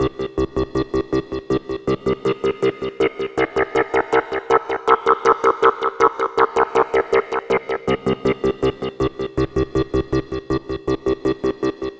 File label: Dance Synths, Hardcore Loops, Synth Loops